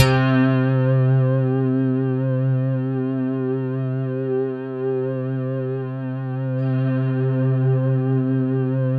C4, Synth Line; NiceBeats

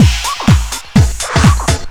flywheel, Deep House 125bpm

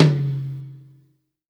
Tom, Live Kit; Sonic Foundry (Sony Creative Software); Ugly Remnants VOL-2; Single Shots; Beatstuff

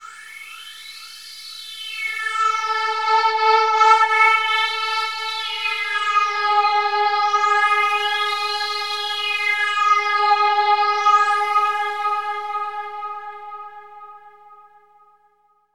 PAD, Drum & Bass

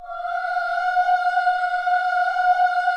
KIDS CHOIR, Gospel; Spectrasonics; Vocal Planet

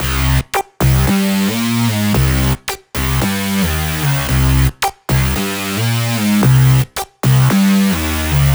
112 C, Melodic Loops; M8; Electronisounds

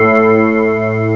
BELL SYN; M8; CMI Factory

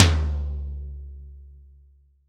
MH TOM, STUDIO TOM

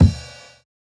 Kick, jungle breaks drum sounds

